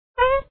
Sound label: fartboard